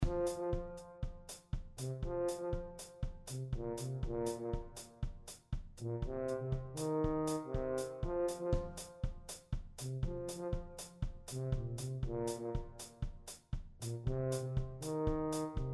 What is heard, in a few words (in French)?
tuba batterie